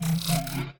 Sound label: staff rattle; smite; ahpuch skin10